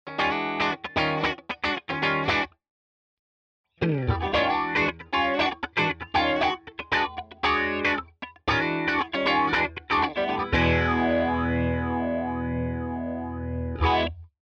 Walrus Fundamental PHASER Medium

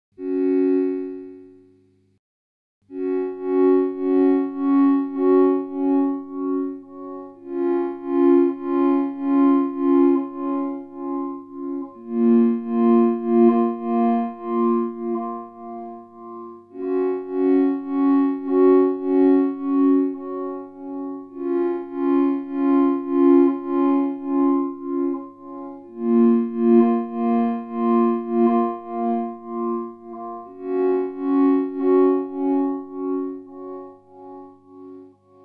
Duophony Y X LFO Synth; GFI System

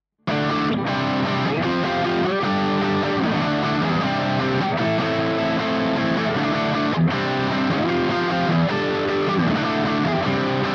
Jazzmaster dist Chords

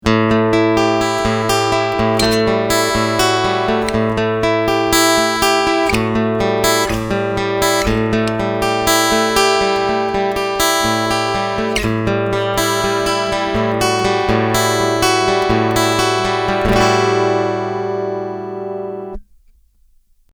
Picking EQ Half

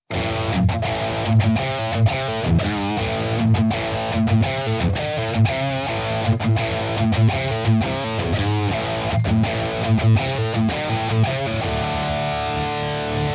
Thight Chug; Blackstar St. James Software

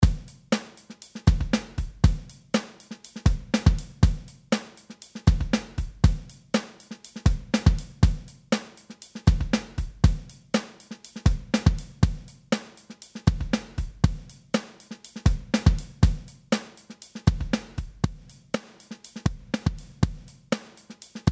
pt10 cs comp limit

